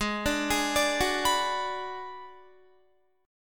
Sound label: Ab Minor 7th Flat 5th Ab, Guitar; arpeggiated; 16,17,18,19,18,18; down; 4E,4A,5D,5G,5C,6F